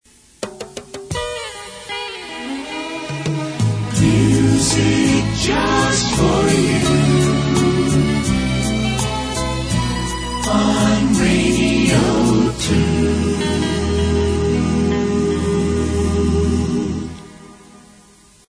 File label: jingle